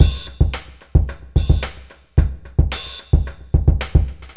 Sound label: bpm110 fx; AKAI S-Series CD-ROM Sound Library VOL-4